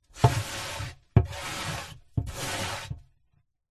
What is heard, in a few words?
foley mud dirt dusting on wooden box scrape off by hand internal pov coffin